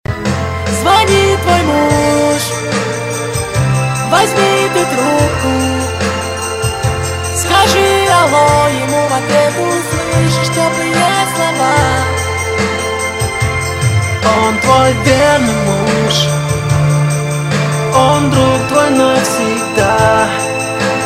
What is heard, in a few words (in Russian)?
rington muzh zvonit